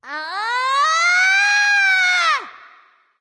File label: battle cry; smite; voicepack; scylla